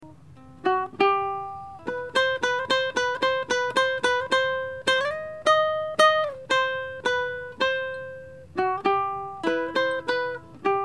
Uke

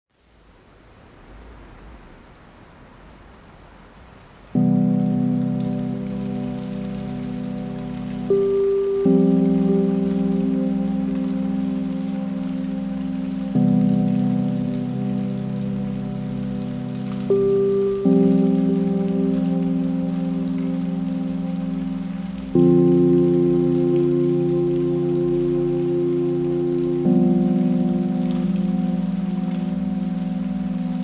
mountain stream

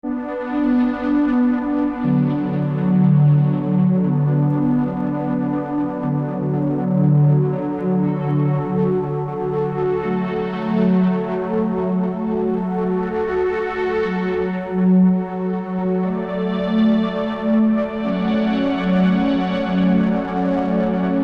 Bm 120 Korg chords

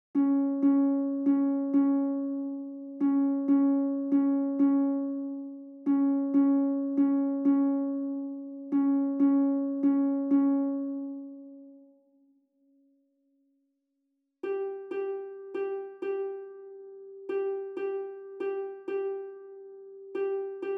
Harp

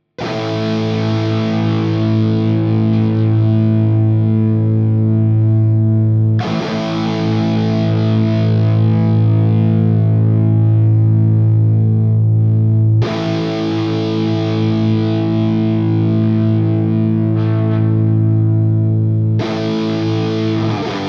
electric guitar

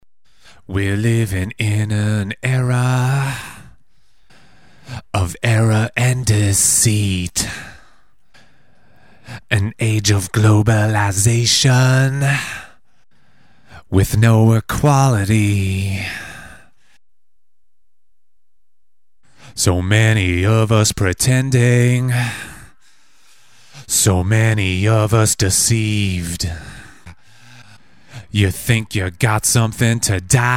VOX

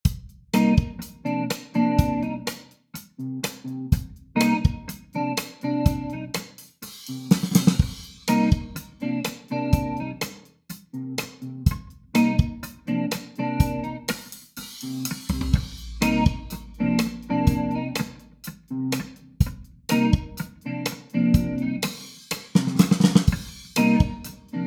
Cm124 Funky Groove

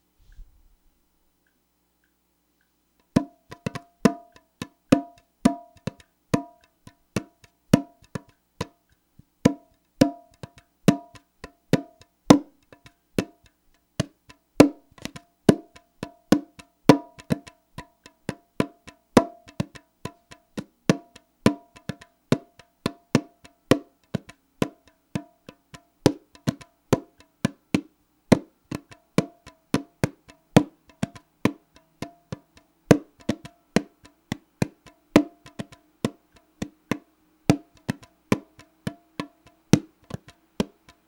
Acoustic Repair Job; admiralbob77

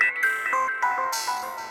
synth Glitch Rhythm 100bpm G

